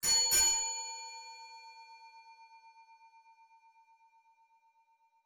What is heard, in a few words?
Bell